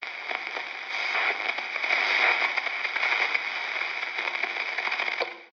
Radio Music; Radio Music Bank

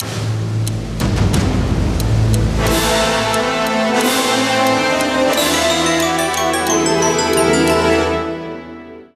Clocktower Intro Theme; epic mickey; wii

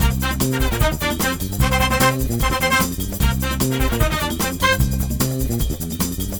Winner Ditty

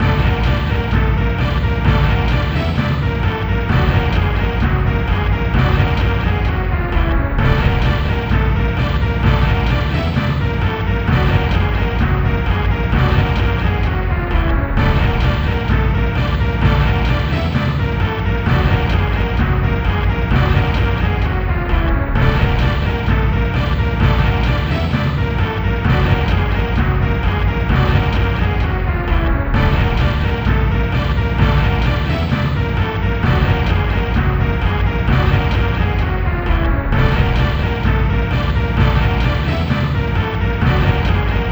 Boss Music; Germs: The Targeted Town; PS1

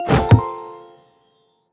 Cell Purchased; Ellen's Garden Restoration; Android

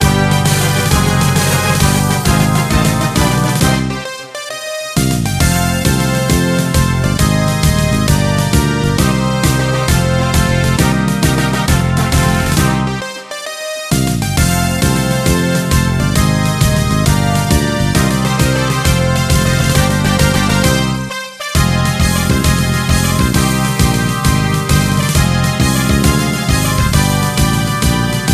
Music Game General event

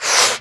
balloon inflate; Birthday Party Bash; Wii

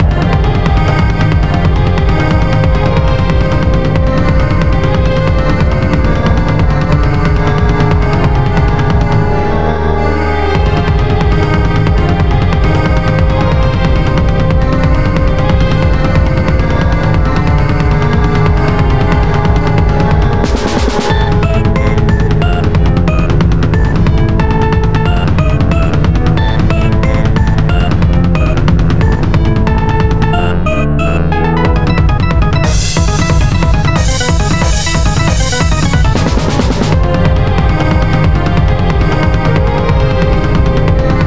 Dungeon Boss Music; The Captives Plot of the Demiurge

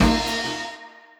Success; chippy

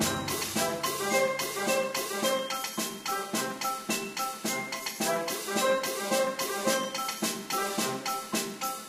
Ballpark; Diner Dash Hometown Hero

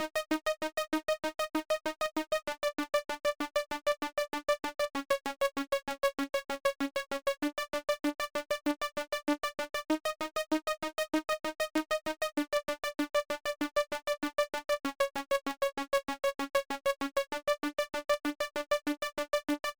Synth Sequence 8 Bars; Vostok Inc.

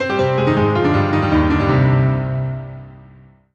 MUSIC EFFECT Solo Piano Negative; startide macos switch windows gamerip 2017

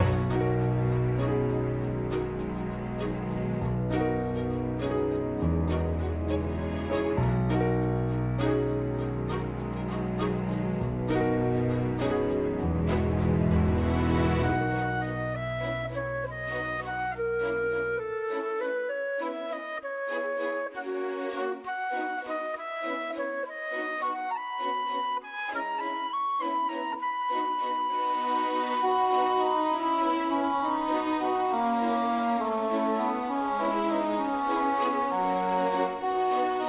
Music Ingame Inn Loop

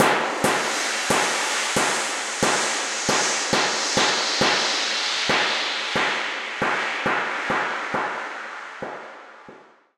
Cave Tsheetgroove

